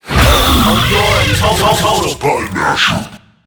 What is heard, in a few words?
Death